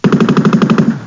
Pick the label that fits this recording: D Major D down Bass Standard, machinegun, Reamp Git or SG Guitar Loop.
machinegun